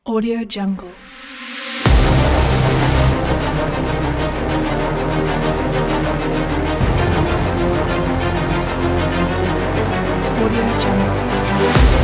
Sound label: sport rock intro; tatamusic